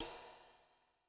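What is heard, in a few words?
Ride Dark, Cymbal; Yamaha DTXpress-II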